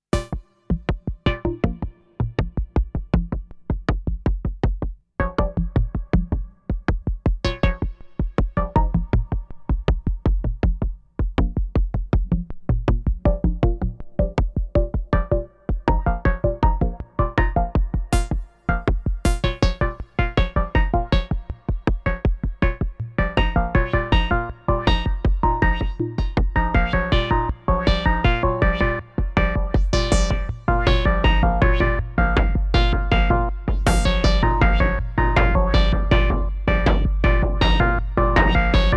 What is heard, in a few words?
Moog Sound Studio Patch Subharmonicon DFAM; Funday